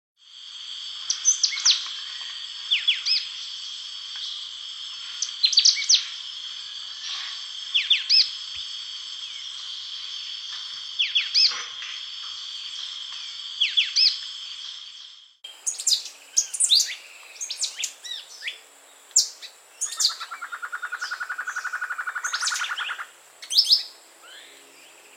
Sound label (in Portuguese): canto do gaturamo verde